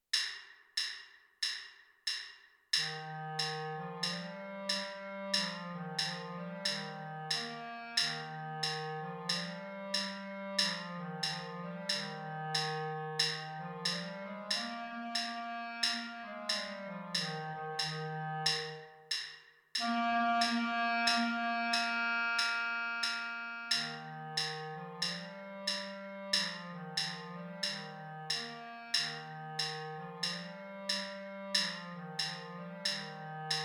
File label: clarinet